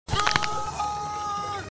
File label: ahhhh